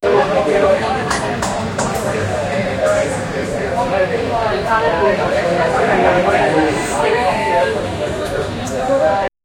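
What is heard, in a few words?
restaurant with people talking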